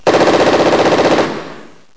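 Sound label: machine gun burst; ambience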